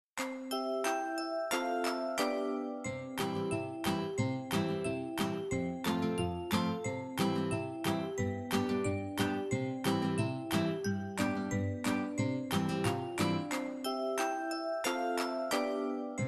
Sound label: bg music